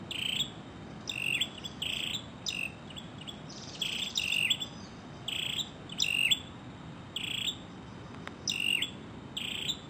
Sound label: Black Necked Tailorbird adult calls; Amar